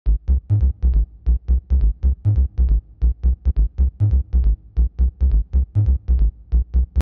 techno bass punchy G# 137; instruments; loops

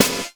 perfect snare one shot c key; tuned drums c key

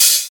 studio open hat sound g key

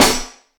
short reverb steel snare drum C sharp key

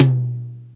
wet tom drum B key

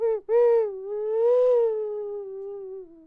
ocarina; chords

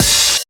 open hi hat sound d key; Duration 0:01; Samplerate 44100 Hz; Bitdepth 24

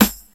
juicy snare sound a sharp key

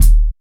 silky kick drum D# key; kick drum samples

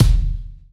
wet kick drum sample D# key; kick drum samples; D sharp key

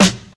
nice snare one shot g key

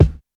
clear bass drum sound d key

